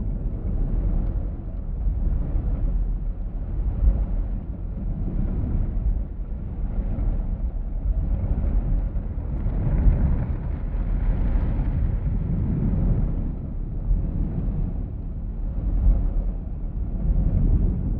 colossus idle; aquatics

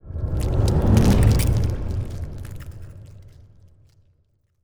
pro ship colossus move; biogenesis

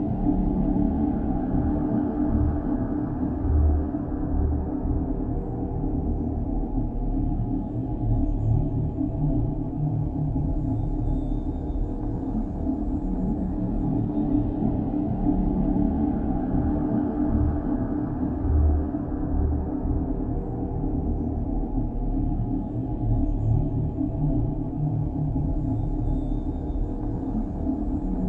ship cruiser idle; Stellaris; machineage

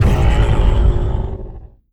railgun fire; weapons; Stellaris

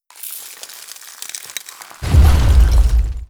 crystal ship large death; Stellaris; other entities

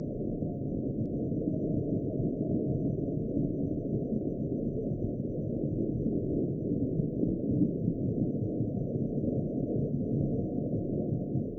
starbase hum; nemesis; Stellaris

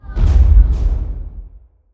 bombardment explosion; Stellaris

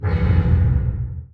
distance laser fire, weapons; Stellaris

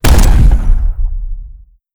adv kinectic fire, weapons; Stellaris

